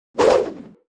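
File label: axe throw; Weapon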